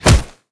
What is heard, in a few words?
shoulder pound, zombie impacts; creatures